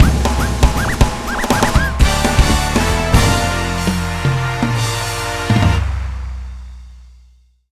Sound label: Game Clear; Digital Glider Airman; PSX